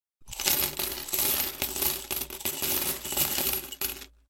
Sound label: Coins Being Poured on Hard Surface, Household